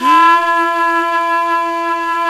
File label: Flute, Singin' Flute